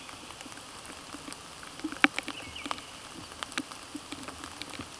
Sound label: Tringa nebularia